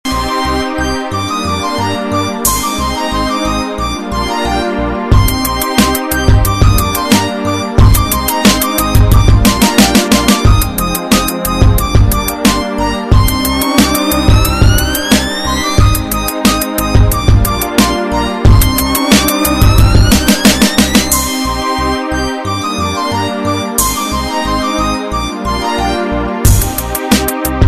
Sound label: ringtone; airtel